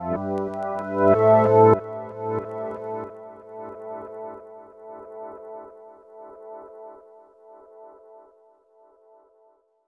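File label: synth, Hip-Hop & R&B; USB Soundscan vol.47; AKAI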